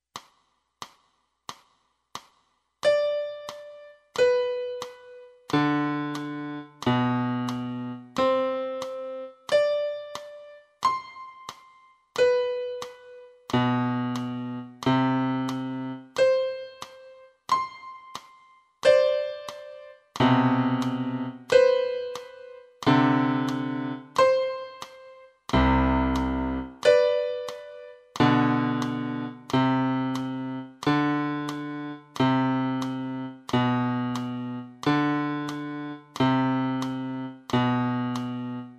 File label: piano